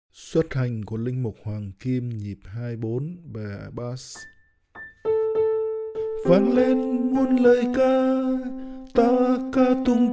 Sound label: BASS